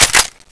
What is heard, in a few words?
bolt pull, HK416c